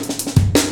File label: Heavy Live A, Drum Loops, Jungle